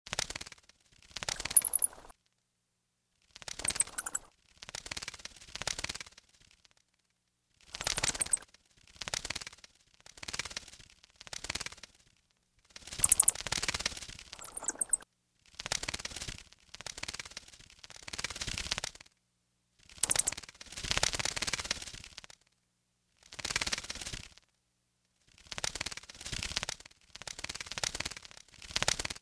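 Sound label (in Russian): мышка залетела в комнату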